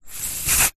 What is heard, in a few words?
household aerosol can spray short; zapsplat